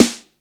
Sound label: snare